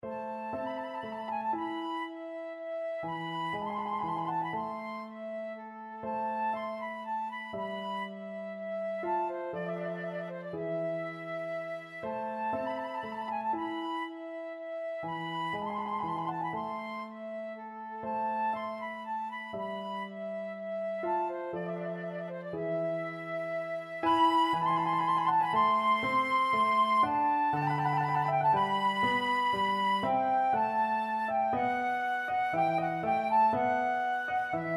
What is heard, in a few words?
concerto grosso, flute